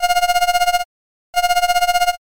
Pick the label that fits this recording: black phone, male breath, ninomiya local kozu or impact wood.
black phone